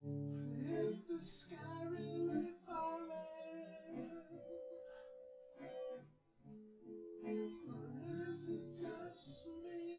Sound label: no drums, synth conv, 250ms, 5cm; SiSEC10; underdetermined